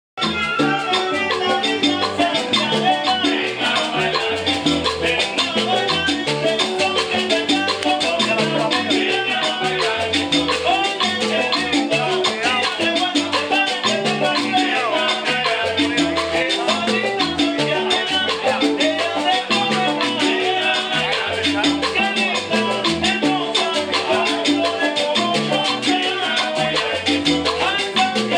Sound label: cuba music